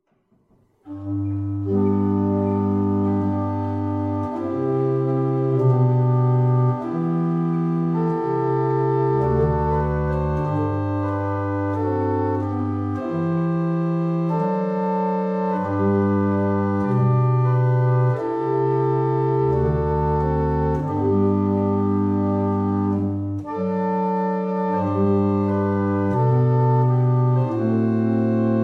Evening Hymn, barrel organs; Staunton

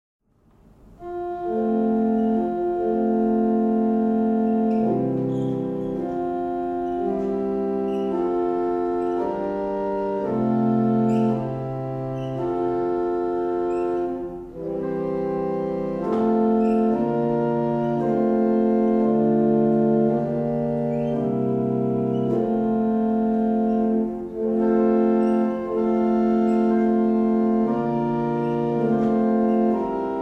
Irish, barrel organs; Edgcote

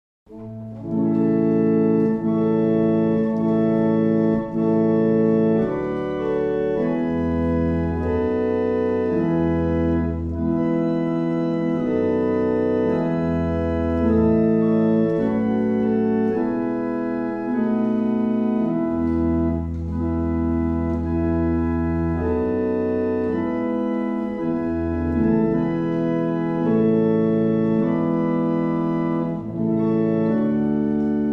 Morning Hymn, barrel organ; Lopham

